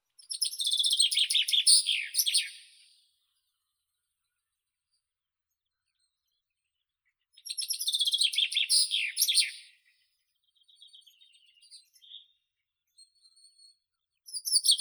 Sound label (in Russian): zyablik fringilla coelebs, zvuki prirodi